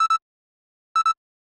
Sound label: Phone Alarm; d4dj groovy mix; android